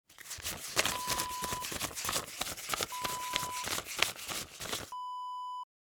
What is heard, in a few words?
money counting bills